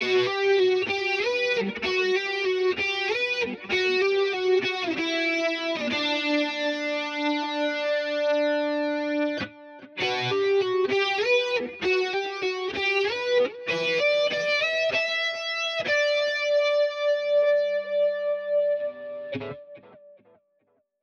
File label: guitar solo